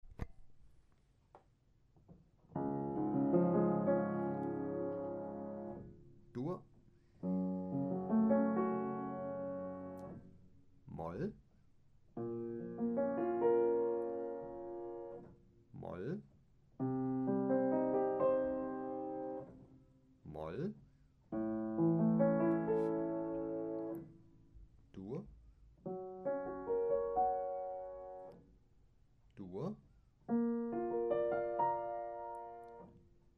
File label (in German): dur moll; gehoerbildung